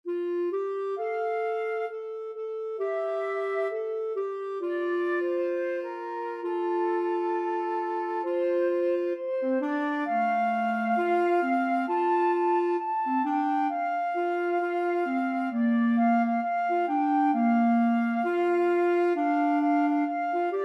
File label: this little light clarinet flute, flute clarinet duet